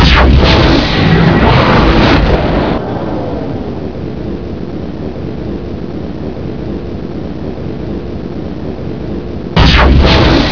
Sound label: gate; monkeyball